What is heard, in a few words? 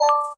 button